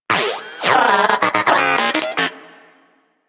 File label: Moa Inquisitive Vocal Enemy Killed; Warframe